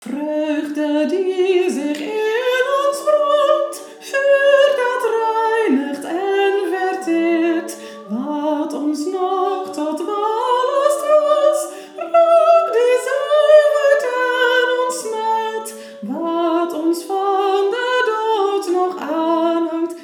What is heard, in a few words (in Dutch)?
Licht geluid tenor